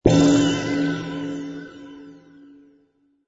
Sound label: Misc Checkpoint